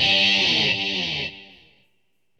GUITAR, ROCK POP; MAGIX

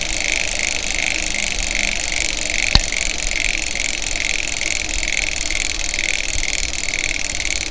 SPINNING

